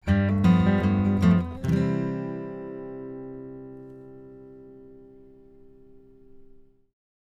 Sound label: Guitar Tag; Tumble; Real American Folk